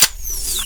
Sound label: Rifle Reload; Warframe; Weapons; Tenno; Rail Jack